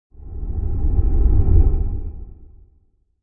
Flame; Sound Design

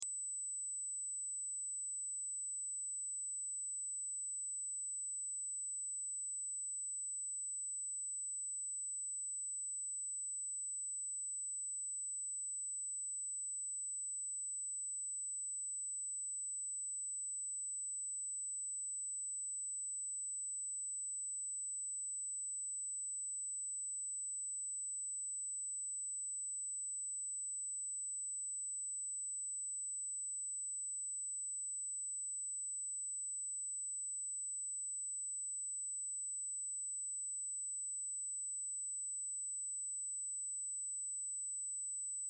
Test Tone Square; Wave 8Khz 60 Secs -18Db Loop; Blastwave

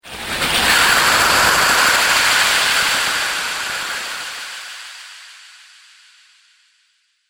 Laser Alien Water, sci-fi laser sound effect; Laser Future - Alien Water

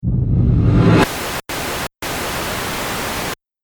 Trailer Hit White Noise; Trailer Sound Effects

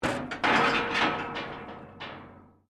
metal rusty move; Materials

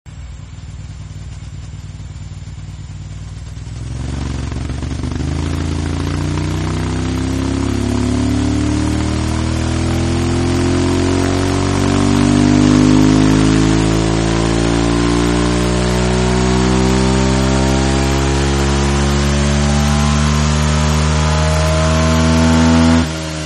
Bike Going Fast, Motorcycles; Vehicles; Partners In Rhyme

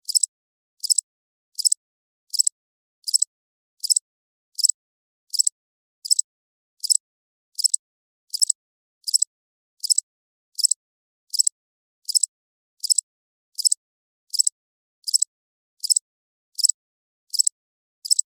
Cricket, Chirps, Ambience, Night, Insect, Bug; Sound Effects:Animals:Insects; Crickets; Blastwave

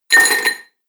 Foley Pouring Ice Into Glass; Drink Mixing Sound Effects

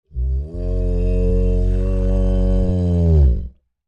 Creature Large Moan Rumble Gutteral; Monsters; Sound Effects:Horror; Big Room Sound

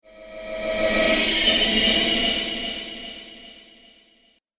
Ufo Zoom Ship; Spacecraft Sound Effects; Science Fiction Sound Effect; Andrew Fleming; Twistedtracks

